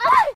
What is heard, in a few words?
Scared Female; Friday the 13th: Killer Puzzle